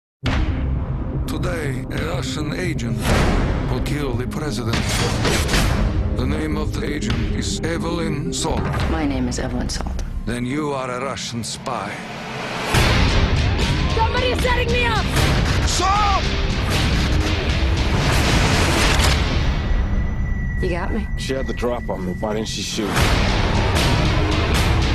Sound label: salt, soundscapes